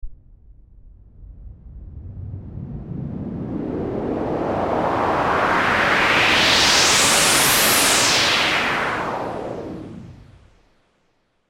White Noise Whoosh, Electronic white noise whoosh

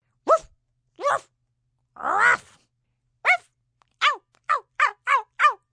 Dog Small, small dog (human voice); Dog barking, Animal

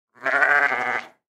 Sheep Baa; Animal; Blastwave

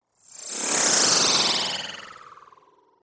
Whoosh, Flutter, Digital

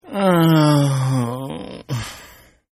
Groan Male; Groaning; Voice

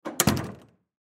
Door Close Hard; Office; Miscellaneous Doors; Blastwave

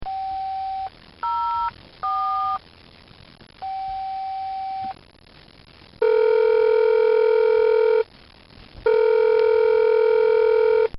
Calling From A Car Phone; Cars; Vehicles; Bobby Cole

